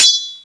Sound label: melee hit; weapons; dota; creep